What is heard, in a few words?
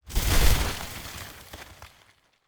objects, World of Tanks